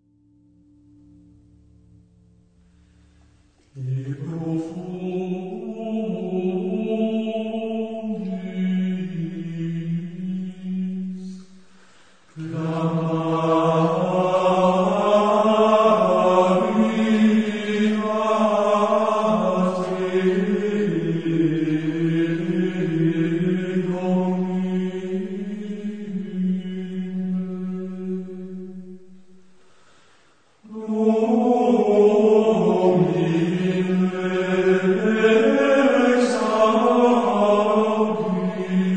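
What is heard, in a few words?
Gregorian Chant; De profundis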